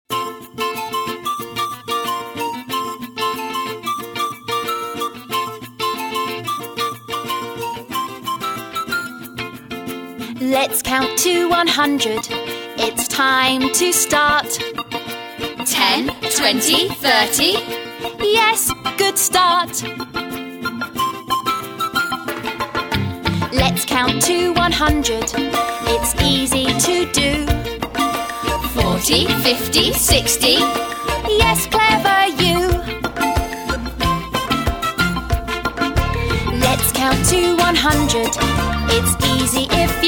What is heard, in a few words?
karaoke